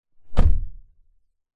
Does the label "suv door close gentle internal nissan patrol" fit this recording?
Yes